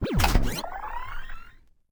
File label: dj booth turn on; Zomboid; Lifestyle